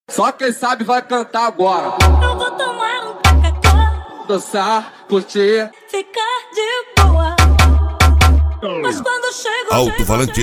sound car; reboque poseidon sartori

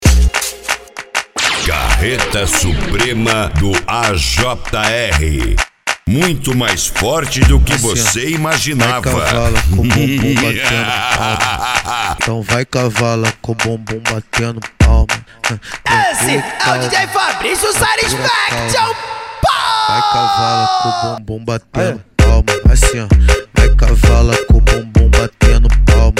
carreta suprema funk

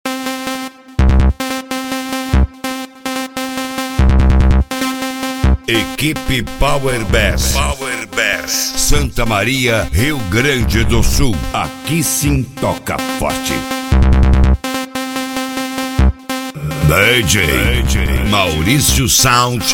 entubacao

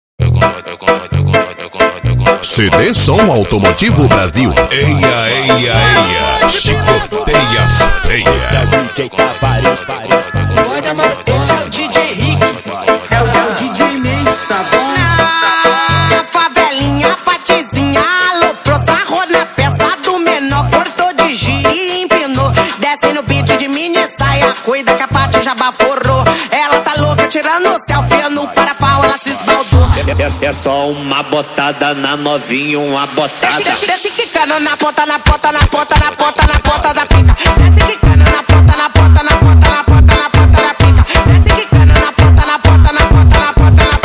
cd som automotivo brasil especial funk

